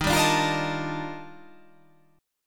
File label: D sharp Major Sharp 11th